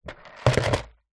foley plastic pot containing small debris set down on table